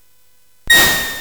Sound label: Dark Castle, CSG8580R5